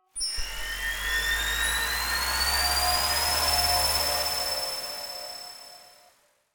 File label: high freq activation; rifts; Stellaris; astral planes